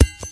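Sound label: grass; Mafie 1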